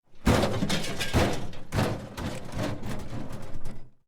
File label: metal box falling over rocks